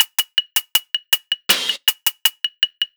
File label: Ragga & Dub Reggae LOOP; USB Soundscan vol.27; AKAI